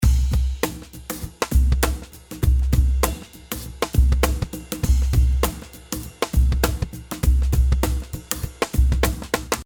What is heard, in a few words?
Cajon Groove